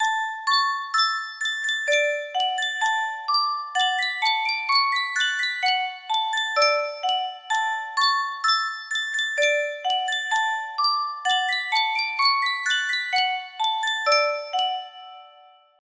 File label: music box, music box melody